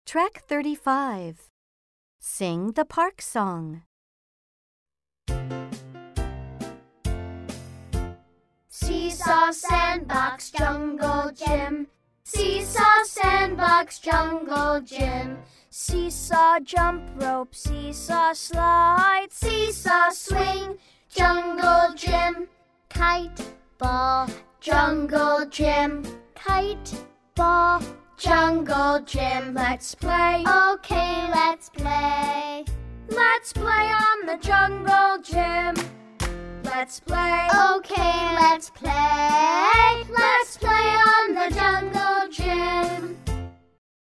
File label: the parks song